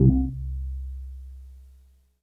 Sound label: PPG pop, PPG Wave 2; Greytsounds VOL-1